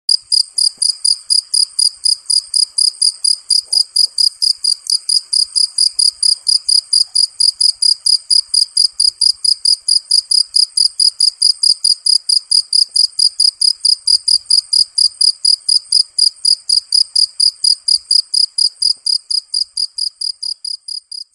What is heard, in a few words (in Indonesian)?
suara jangkrik asli